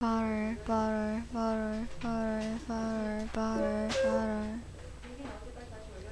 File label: bottle